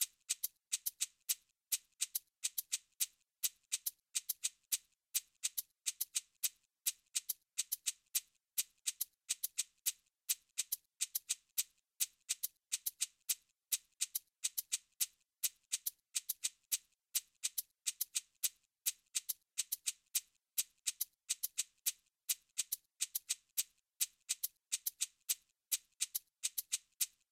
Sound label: abakua havane erikundi